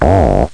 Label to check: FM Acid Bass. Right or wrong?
Right